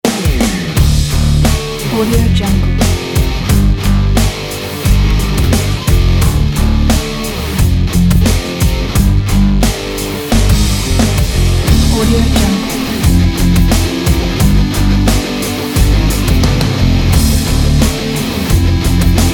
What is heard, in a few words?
hard rock logo